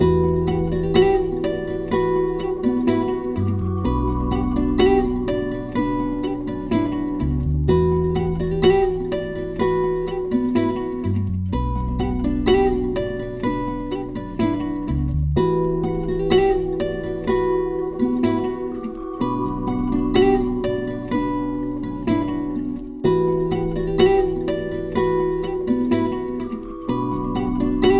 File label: 125 BPM B Min, Melodies; Sunset; Cymatics; Immortal Production Suite Beta